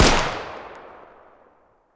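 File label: fire distant 300blk rifle exterior; gmod; arccw uc commons attachments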